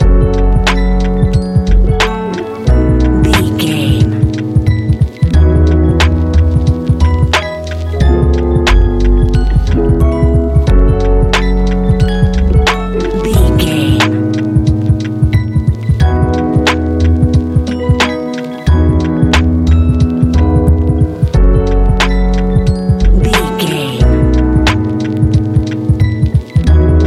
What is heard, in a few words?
transistor nightscape, A relaxed and chilling piece of music